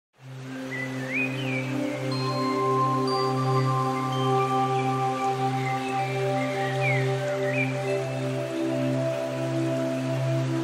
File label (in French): Musique Oiseaux Eau